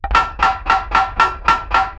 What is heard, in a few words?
old pirate wooden metal, cannon